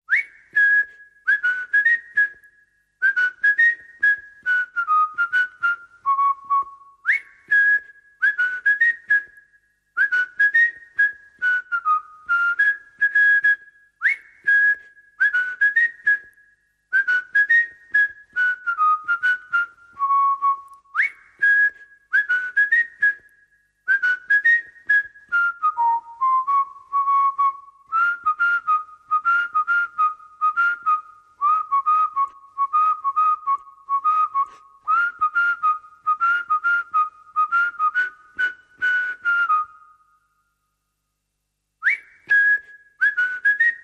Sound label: Whistle Only